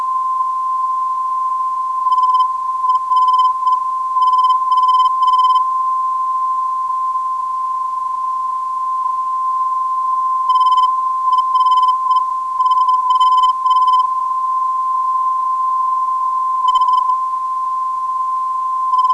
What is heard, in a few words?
328kHz, ndb